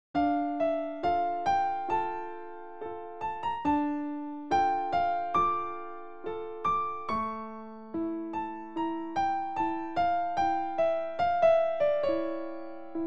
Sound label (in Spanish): escala